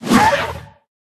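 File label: riding lizard dodge; ezdovoy yasher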